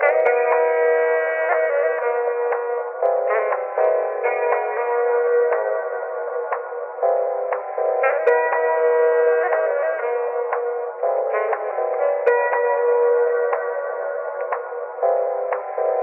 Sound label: sax and keys, loops, phonky